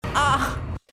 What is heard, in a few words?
moan